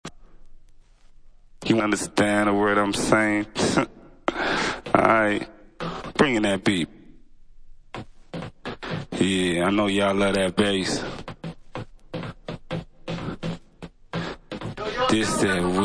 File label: acapella